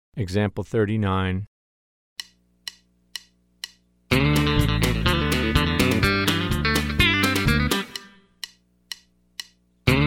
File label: Country Rock